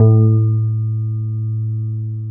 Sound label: CRYSTL HRP; Synclavier Sampler Library; KEYS AND GUITARS